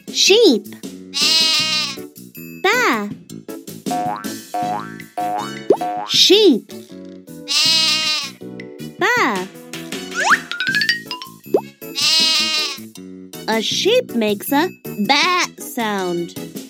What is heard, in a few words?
sheep